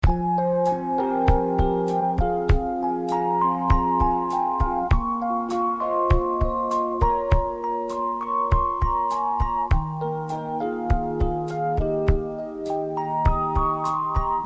Soft Ringtone

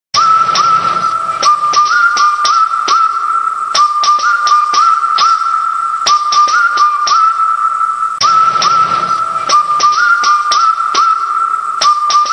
New Whistle; Dhoom 2

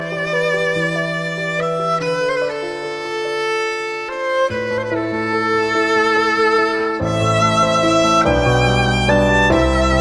Sad Violin

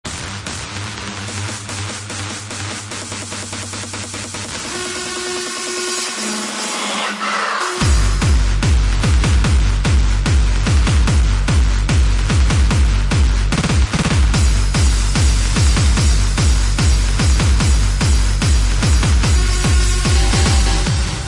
Hardstyle

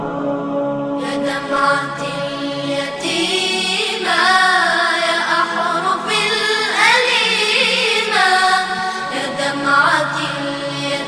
Ilahi Shqip

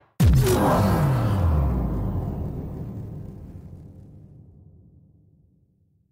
Impact Shutdown

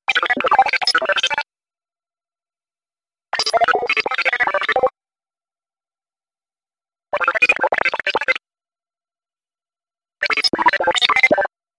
Digital Mess

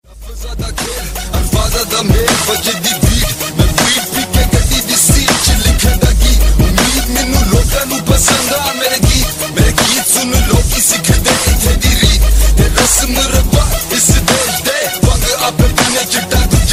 Shocking Bass

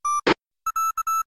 Notification

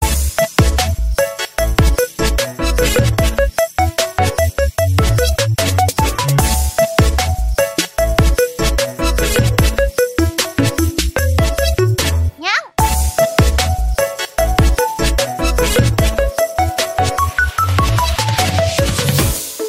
Bubbly Ringtone

